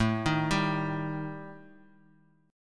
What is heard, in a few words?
G Suspended 2nd Sharp 5th